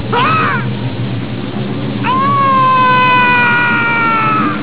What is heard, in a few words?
scream; chekov